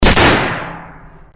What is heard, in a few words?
gunshot; goldsrc